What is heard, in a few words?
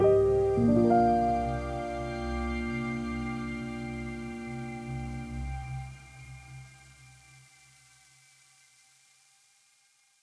Piano; BB 2HTC Samples Vol 4